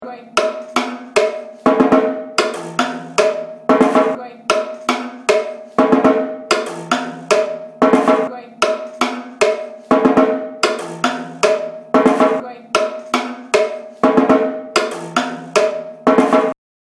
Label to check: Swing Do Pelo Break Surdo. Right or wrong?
Right